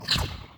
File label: woosh